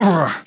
voice male hit

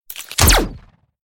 battle wall attack; hideout

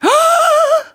voice female critical

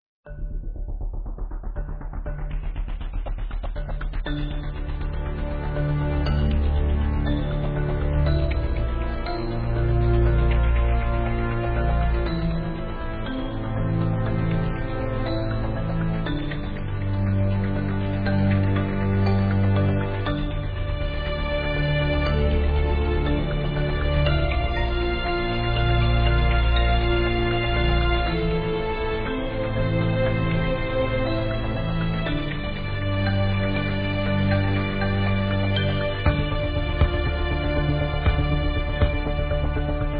soundtrack

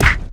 battle punch

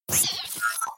battle attacker dodge; hideout

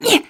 voice female hit

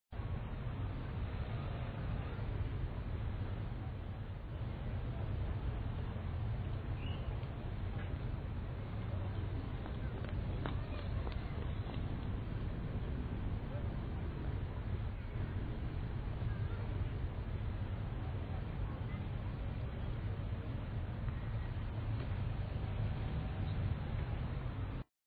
ambience location

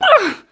voice female hit

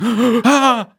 voice male critical

